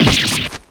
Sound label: damage; soj-dhurke